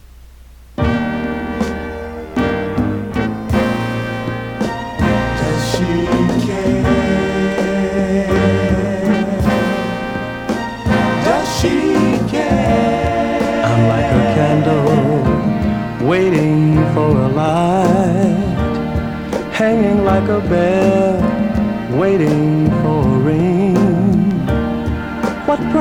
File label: whispers